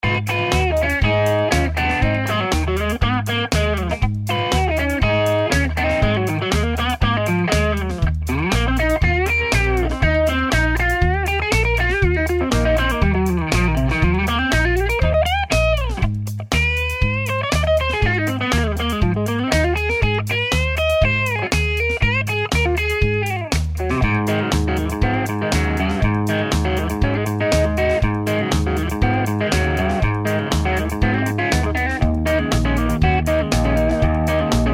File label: crunch bridge neck, Tele; schwarzbach; VP-Tele-57